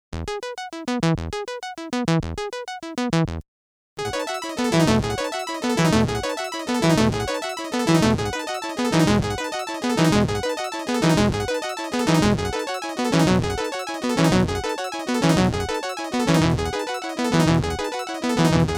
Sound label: Arp; Hedra Plus Enzo